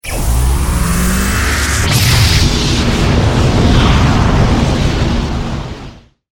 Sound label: energy charge gun